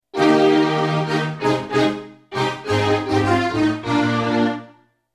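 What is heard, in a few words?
E-MU III Strings; E-MUIII Strg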